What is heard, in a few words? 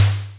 damage; digital